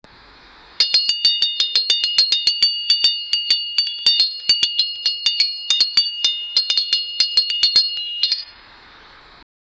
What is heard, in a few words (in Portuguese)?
barulho de uma armadura, armor